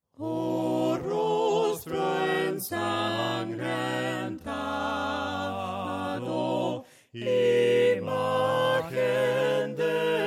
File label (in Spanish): VOCES, himnos a capella; cantos del camino; OH ROSTRO ENSANGRENTADO